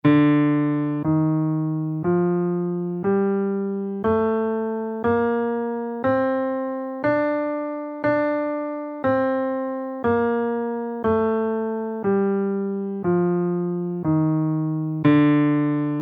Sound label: phrygian scale in Dm